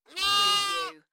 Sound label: Lamb bleating; beanstalk audio